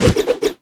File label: dualswords